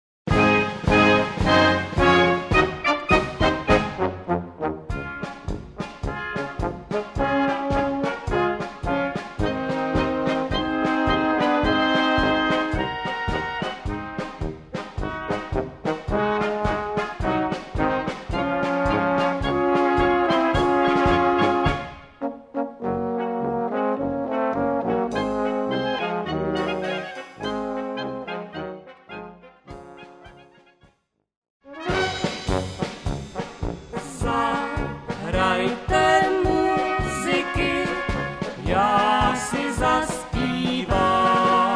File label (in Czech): polka